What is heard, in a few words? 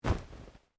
Clothing shirt cotton flap; Movemement sounds for various articles of clothing; Dressing; Human; SoundEffects Foundation2